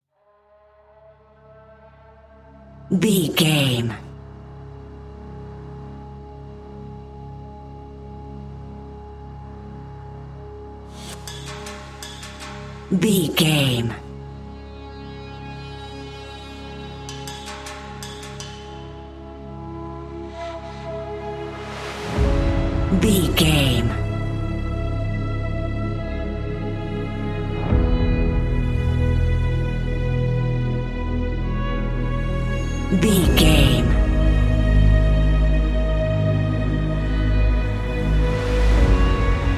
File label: epic trailer